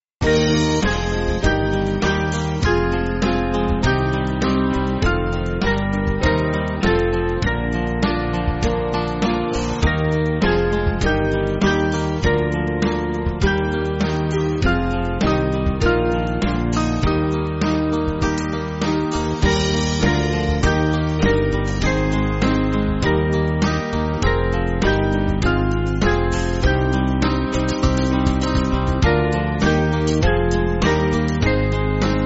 Band

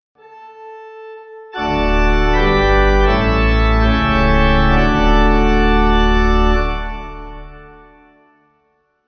Pipe

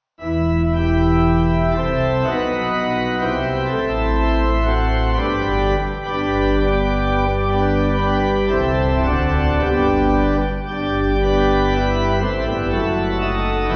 Pipe Organ, Music Backing Files for Churches; O God We Praise; Manchester

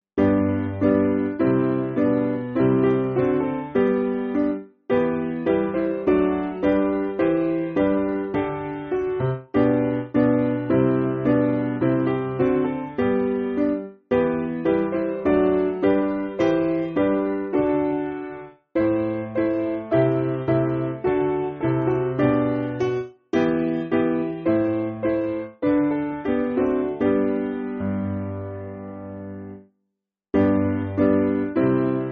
Piano, Free Music Backing Files for Churches; Morgen Kinder

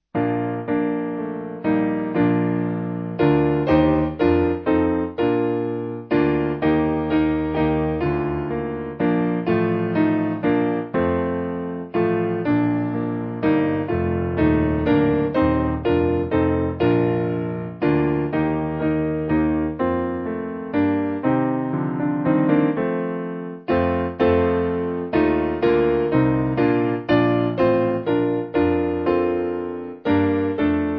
Piano

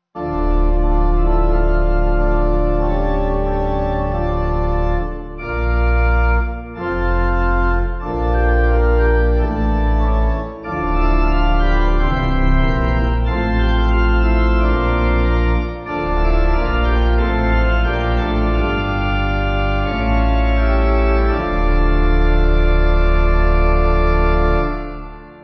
Pipe

